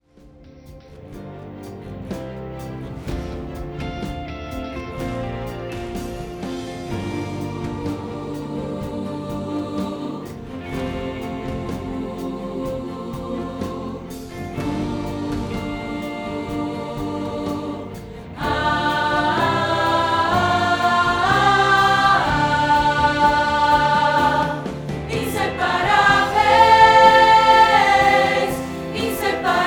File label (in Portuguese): soprano